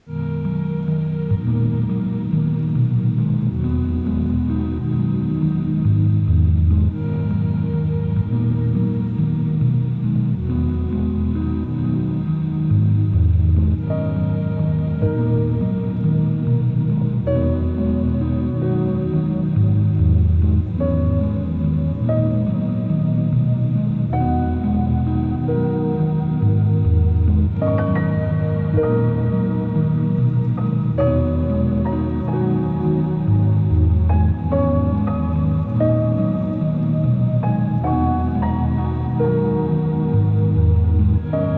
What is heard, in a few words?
AMBIENT LAKE